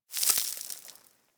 Settlement Sand Fine Debris

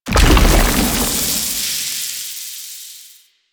Octopede Horrors Arm Collapse; Warframe; Man In The Wall

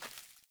Sandals Run Vegetation, Footsteps; Characters; Player

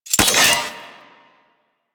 Sniper Rifle Alt Mech; Warframe; Weapons; Corpus

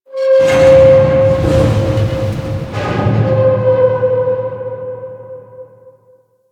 Metal Groans; Ambience

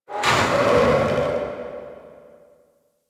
Corner Hall Rand Metal; Warframe; Ambience

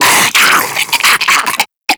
Infested Diseased Ancient Charge; Warframe

